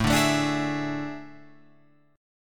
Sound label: A Major 7th Suspended 4th, Guitar, Open F; AM7sus4; strummed; JGuitar